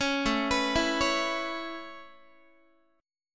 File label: A Major Add 9th A Guitar, chord; 16,17,14,14,17,x; Aadd9 arpeggiated